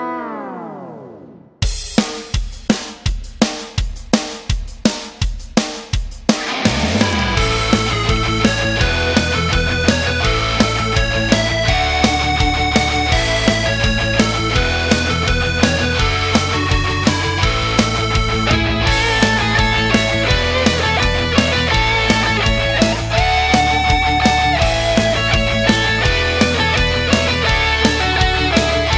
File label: auld lang syne rock